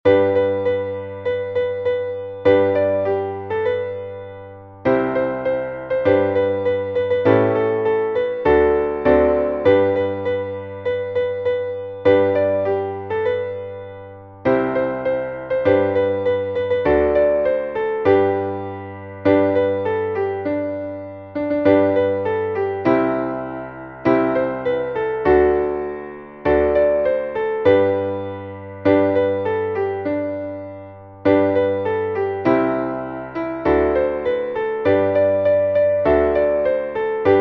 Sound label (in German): klavier jingle bells